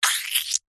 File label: cartoon squelch squish mouth saliva